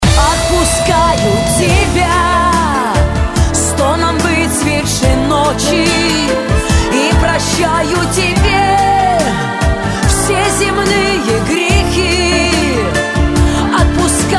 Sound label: Ringtone; Samsung